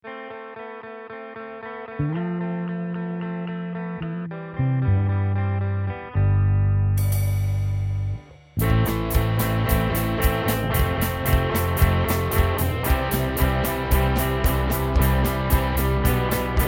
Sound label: 4/4 rock beat garage band